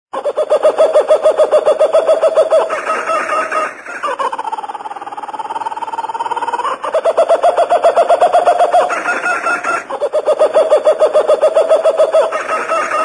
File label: kookaburras